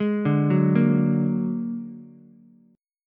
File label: A sharp Augmented 7th A sharp Ukulele; A#+7 arpeggiated; JGuitar